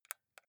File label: console controller xbox joystick press slow